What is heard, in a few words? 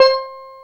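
steel drum hi; CALIMB+STEEL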